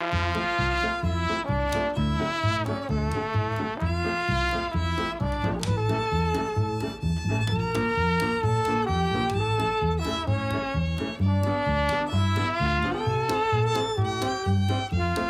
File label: Music Car Song